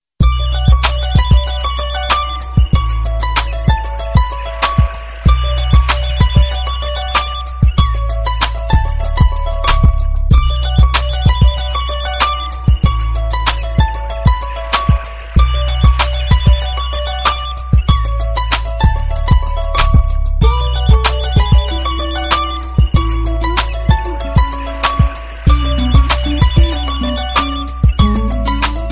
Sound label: crazy ring phone